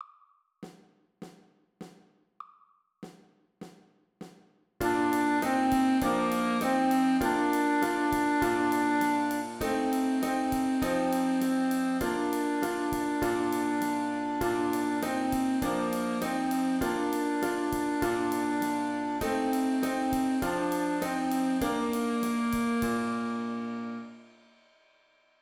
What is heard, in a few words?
Mary Had a Little Lamb Winds